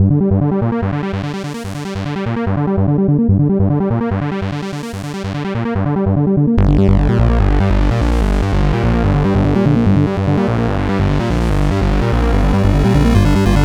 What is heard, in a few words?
boss fight